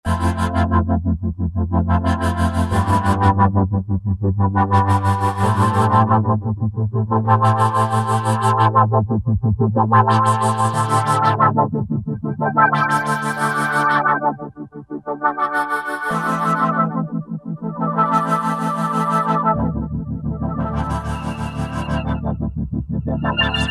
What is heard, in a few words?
LFO Vox; Roland JV-2080